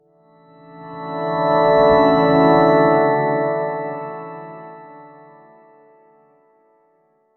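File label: bright friendly and modern, pluck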